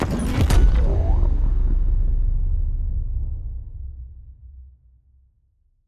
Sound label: ship titan move; ships; Stellaris; shroud